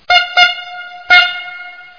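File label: squeaky horn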